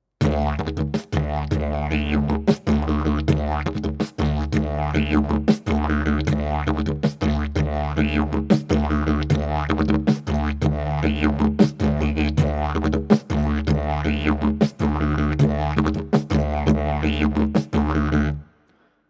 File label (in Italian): ritmo livello avanzato